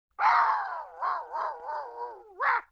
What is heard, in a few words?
LoFi Synthetic Dog Squawk; Vintage Cartoon; Comedy Vocals